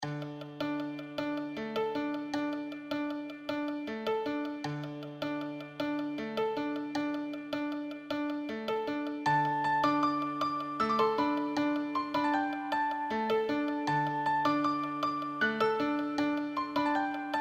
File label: flute theme advanced solo